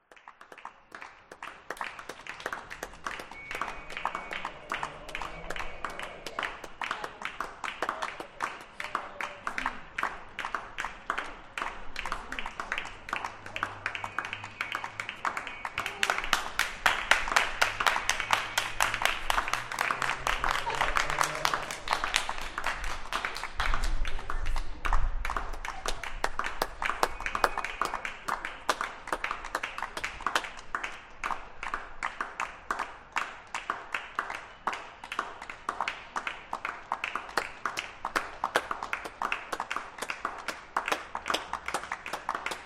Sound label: NHS Clapping